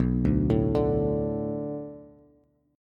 B Minor 7th B Bass, chord; Bm7; arpeggiated; 7,5,7,7; JGuitar

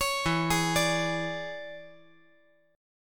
E 13th

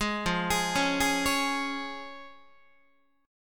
F Minor Sharp 5th, F, Guitar; arpeggiated; 4A,4E,5A,5D,5Gsharp,6Csharp; 11,13,11,11,12,12; JGuitar

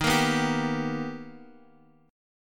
B Augmented Major 7th Eb, chord

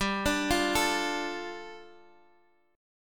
G 7th Suspended 2nd, Guitar Half Step Down

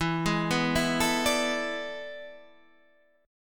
A Major 7th Suspended 2nd Suspended 4th

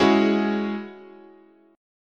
Ab 7th Suspended 4th Sharp 5th, chord, guitar

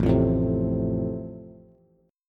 A Minor Sharp 5th A, Bass; Am#5 strummed; 5,8,7,5; JGuitar

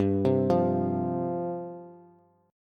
G 7th Suspended 4th G down Bass; G7sus4 arpeggiated

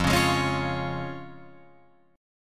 F Minor Sharp 5th, Guitar Open F, chord; 5,8,8,8,8,8; Fm#5 strummed; JGuitar

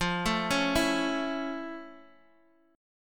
F Augmented Major 7th Guitar DADGAD; x,x,3,2,4,2

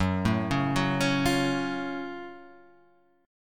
Gb Minor Major 7th Gb down Guitar Half Step Down

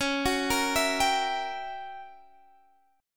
Gb 7th Flat 9th Db

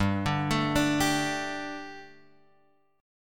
G Suspended 2nd G, down, Guitar, Open F; Gsus2 arpeggiated; JGuitar

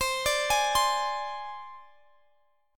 C Suspended 2nd Sharp 5th

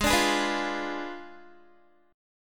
Ab Diminished 7th Ab Guitar 4E,4A,5D,5G,5C,6F; strummed; down

